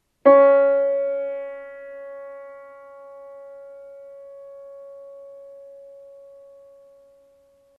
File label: piano C sharp